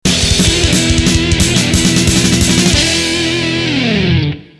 finish - win; ocean city harbor; Wave Race Blue Storm

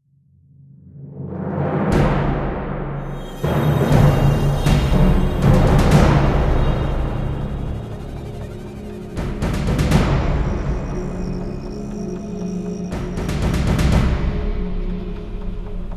Music Template - Tension; Mass Effect 2

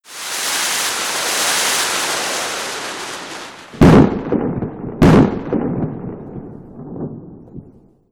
Boot sound; defense dome; wii u

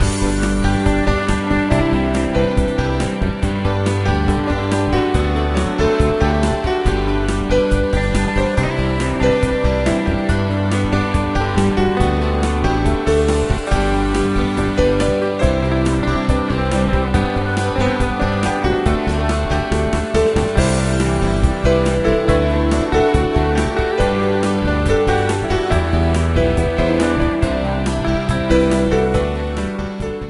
Uptempo Music

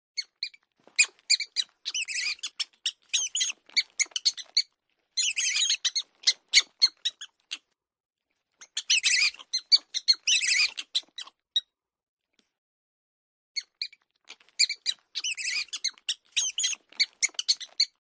Rats Squeaks; Don't Be Afraid The First Toy

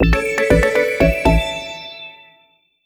Jingle Fanfare

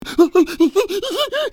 Pig fear; bad piggies; android; mobile; windows

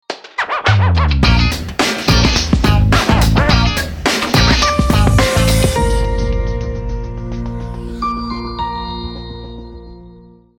Attraction Park jingle

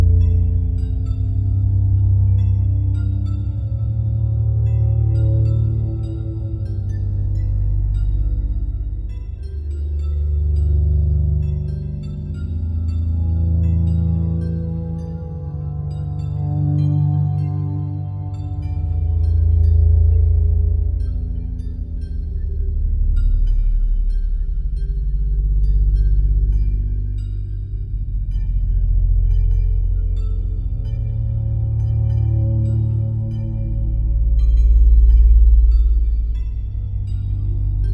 Screeching Voices; bibsys original soundtrack 2022

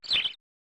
Bird Call; okamoto ayako to match play golf 1994; snes

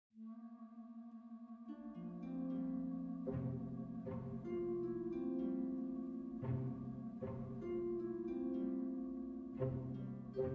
World Music

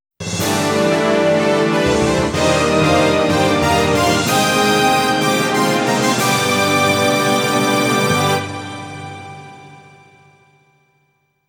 A Victorious Fanfare; D A Black sound collection

